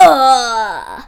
dies; player; isaac

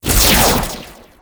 laserring medium

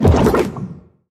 smelter swallow

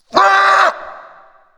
horse dies; war

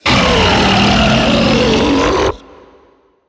beast vocal switch side

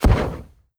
siren minions black smoke

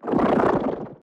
growl small; fistula

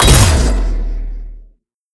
holy shield shatter

